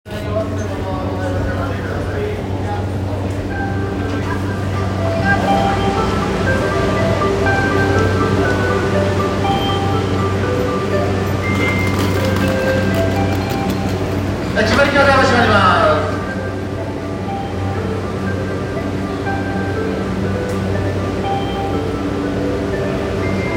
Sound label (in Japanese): JR横須賀線品川北, train sounds